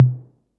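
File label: 808 Tom MID; Concrete Collage Drum Kit; Concrete-808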